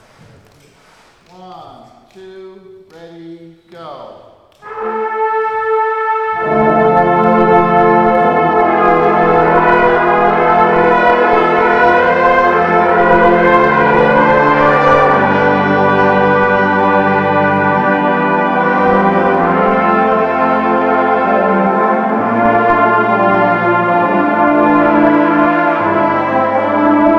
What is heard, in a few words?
Adagio for Strings Run Through, brass